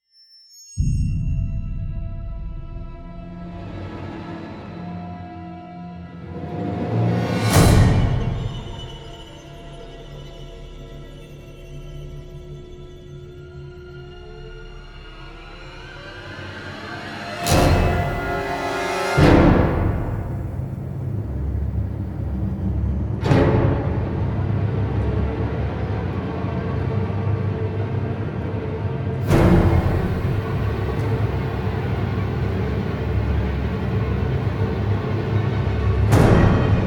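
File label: ambient orchestra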